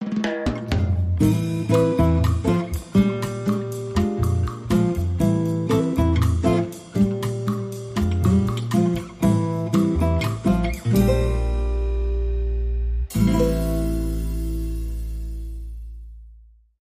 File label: Bossa Romantico